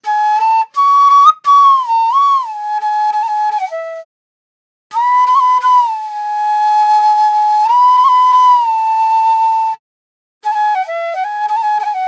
90s Flute Loop A

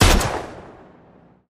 Gun Sound; Gangstar; Gully Loops

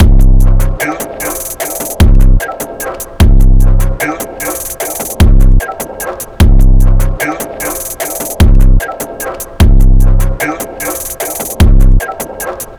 Drum Loop 150 BPM; Gully Loops; Modi; Atma Sample Pack

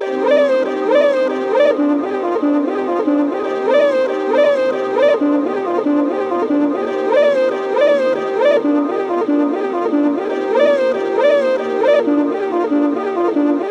Melody Loop BPM 140 G; Jesus; Divine Type Hip-Hop Sample Pack; Gully Loops

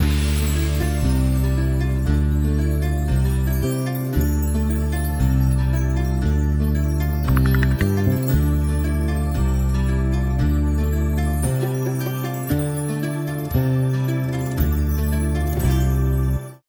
Melody Loop BPM 115 D Min; Gully Loops

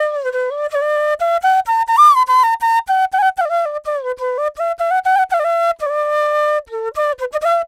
Flute Loop D Maj 125 BPM; Gully Loops; waves of bihu vol 1

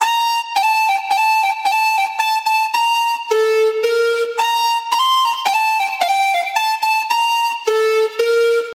Baraat Bonus Loop BPM 110 G; Gully Loops

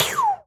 FX Gun Light; aawaz

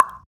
Beat Box Snare; aawaz

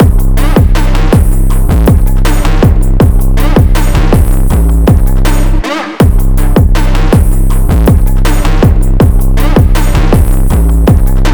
Drum Loop 160 BPM; Gully Loops; Atma Sample Pack

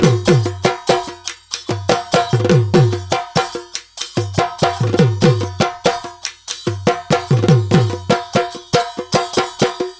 Processed Dhool Loop; Gully Loops; waves of bihu vol 1

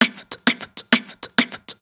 Beat Box Snare Loop; aawaz

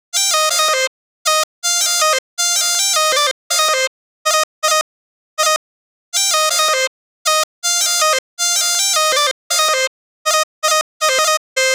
Chop BPM 160 C Min; Gully Loops

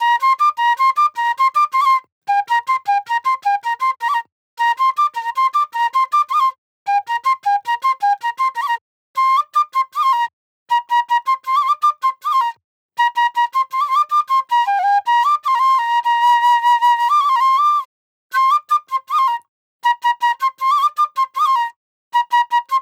Preety Flute Loop BPM 105 A; Bihu; waves of bihu vol 2; Gully Loops

